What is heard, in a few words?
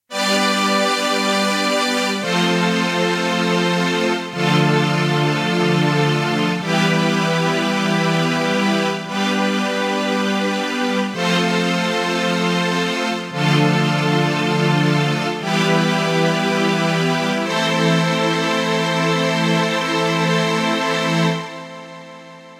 Wagner Str, Kawai K1 preset